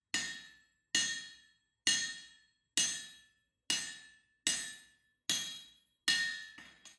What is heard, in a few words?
ambience room forge working layer